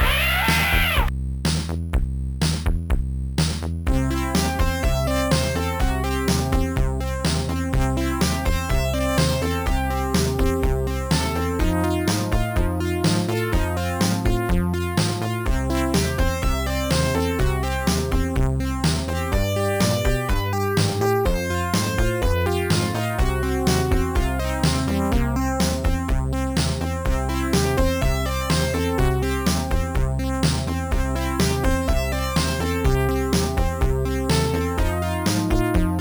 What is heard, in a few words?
baby elephant; Sonix Music Driver